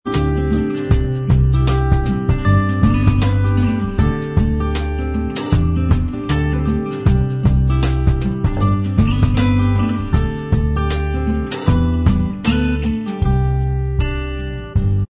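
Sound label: acoustic style instrumental track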